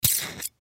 Needle Pull Out, Needle Pull Out Of Plastic Tube; Surgeon Surgery Four Inch Disposable Bone Marrow Biopsy Aspiration; Hospital; Emergency

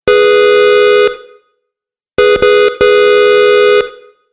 Car Horn Two Sounds; Car Sound Effects; vehicle

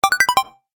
Game App Discovery, Video Game Sound Effects

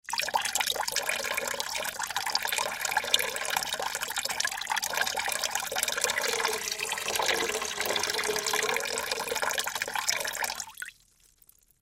Pour Water, Pour Water Into Plastic Bucket Slow; Pour Water Into Plastic Bucket Slow Long; Pouring (Sound Effects:Objects); Blastwave

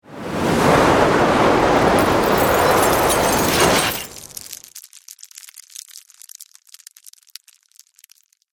Wind Freeze; BlastWave

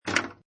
Pinball Sound Ball Rattling Objects

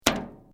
Metal Light Movement Minor

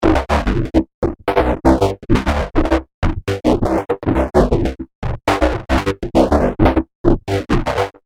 Loop Gated Distortion, music loop; Royalty Free Miscellaneous Loops; Andrew Fleming

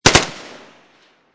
AK47 2 Burst Barrel, Machine Gun; Machine Guns; VRSonic

